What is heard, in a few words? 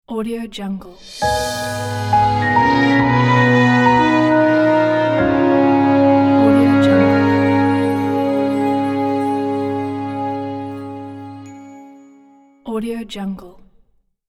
fairy tale logo